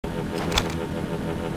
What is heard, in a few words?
stun rifle draw; weapons